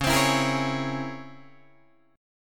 Db Minor Major 13th Db Guitar DADGAD; DbmM13 strummed; JGuitar

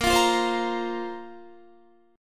A sharp Suspended 2nd down Guitar; A#sus2 strummed

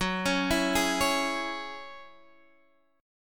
F sharp 7th Suspended 2nd, Guitar, Open G

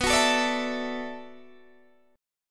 B Minor 11th B down Guitar DADGAD; strummed

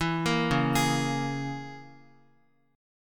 Db Minor 6th Db Guitar; arpeggiated; x,x,2,3,1,3; 4E,4A,5D,5G,5C,6F

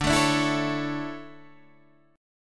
D Major 7th Suspended 2nd, Guitar Standard; strummed; JGuitar

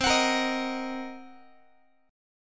C Minor 7th Flat 5th Guitar, chord; Cm7b5 strummed; JGuitar

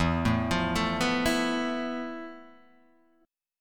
F Major 7th Flat 5th E, guitar chord

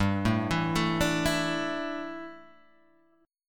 E 7th Suspended 4th G, guitar; E7sus4/G arpeggiated; JGuitar

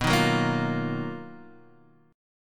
B-Major Sharp 11th B down Guitar Open D

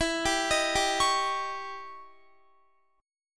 E Minor Major 13th E down Guitar; EmM13; 4F,4A,5C,5G,5C,6E; arpeggiated; JGuitar

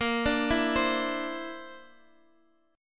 D 7th Suspended 2nd Sharp 5th Bb

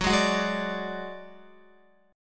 Gb-Suspended 2nd Sharp 5th, Guitar; Gbsus2#5 strummed; x,9,8,11,8,10

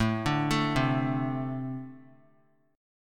A-Minor Double Flat 5th A; arpeggiated; JGuitar

